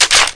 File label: reload S M G Clip Out